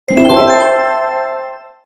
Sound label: bonus poker; yule; cardtiger